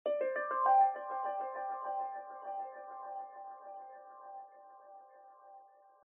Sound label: win